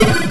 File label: knight arrow; mazeofgalious